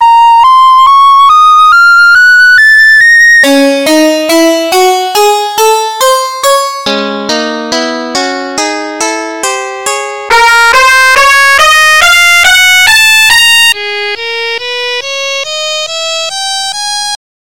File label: A sharp harmonic min